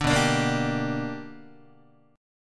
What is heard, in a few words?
B-Minor Major 7th Sharp 5th B down Guitar; strummed; JGuitar